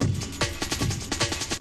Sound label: Bite Size Beats